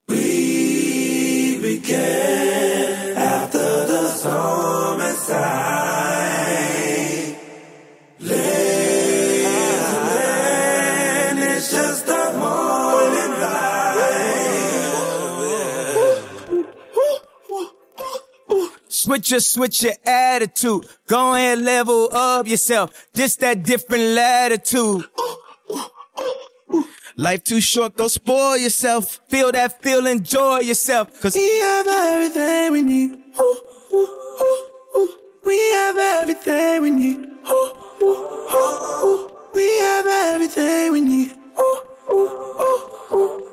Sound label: vocals